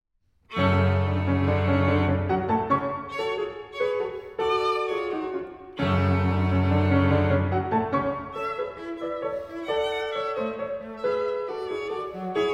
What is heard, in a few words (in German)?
Klavier Trio